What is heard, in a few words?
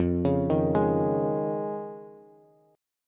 F Minor 7th Sharp 5th, Bass; JGuitar